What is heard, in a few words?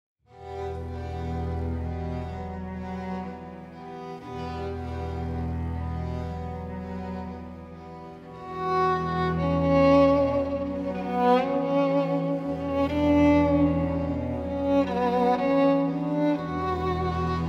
violin concerto excerpt